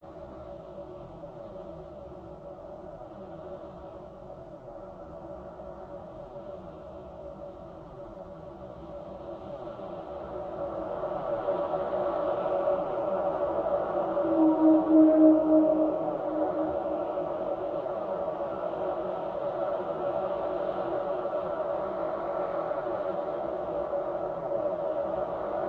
Ambiance Tonal Fog

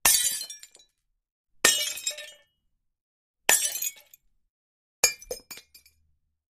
Glass Break X4

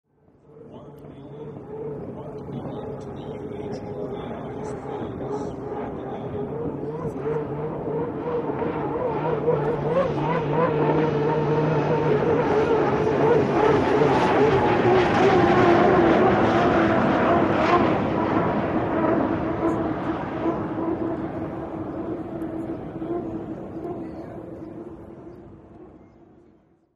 Hydroplane By Ambie

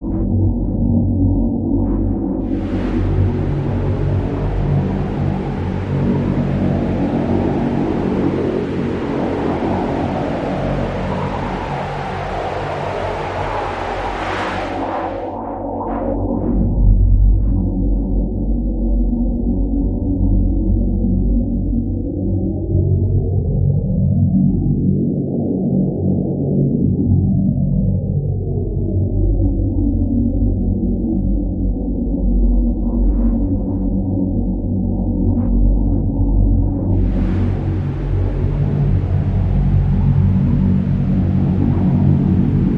Belly Of Ship Low Grindi; evolving

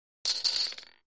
Poker Chips Tossed; casino games; chip mvmt

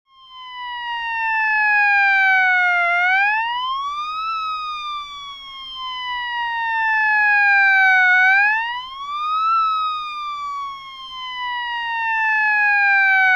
Siren; Static Fire Engine With Siren.

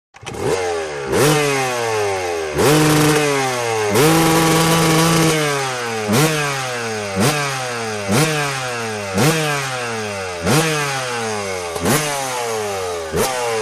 Motorcycle 250, motocross

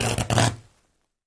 Electric Arc, Short Circuit, Sputtering Zap; arcs & sparks

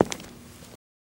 FS Male On Hardwood, singles wood

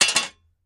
Shovel Drop Metal On

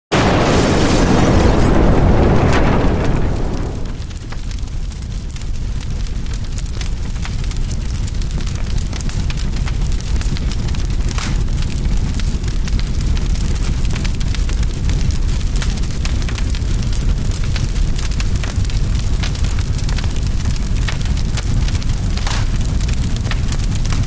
House Explosion; buildings

